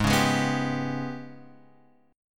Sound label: G sharp Minor Major 7th Double Flat 5th, Guitar Open F; strummed; JGuitar